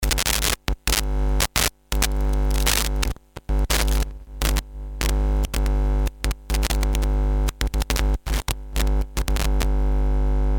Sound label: Modular; cube-base; Sol Lewitt; Texas Sci-Fi